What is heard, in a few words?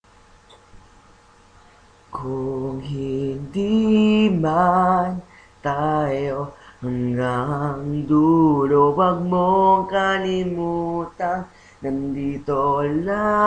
kundiman chorus